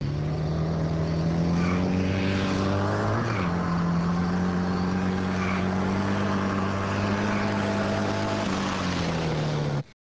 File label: motorcycle